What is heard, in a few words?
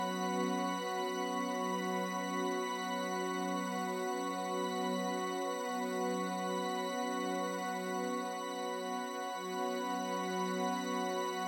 loop build; Flight of Light